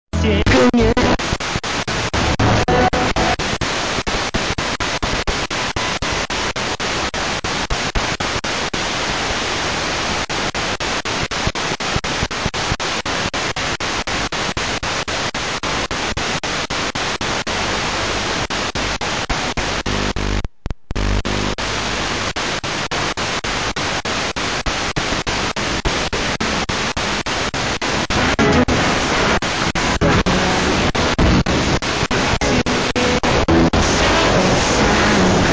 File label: 85 110 MHz